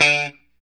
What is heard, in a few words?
POP D 3, STRAT guitar; Spectrasonics; Hans Zimmer Guitars VOL-1; JC-STRAT POP